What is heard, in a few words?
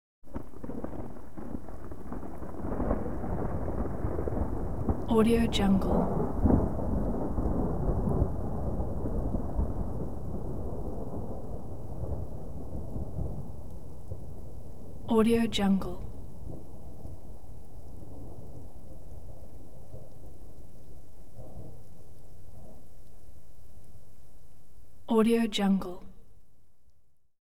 thunder receding soft crackle rumble; hollywoodedge